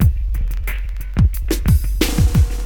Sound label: DM LOOP, Hip Hop, Drums; LiveAct Pool